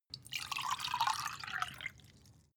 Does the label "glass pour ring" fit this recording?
Yes